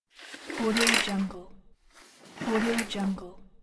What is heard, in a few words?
drawing curtains thick fabric two sides slow